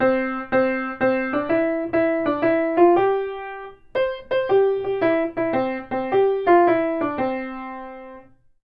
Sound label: Piano Play Song Row Boat; SFX Kit; Foley - Misc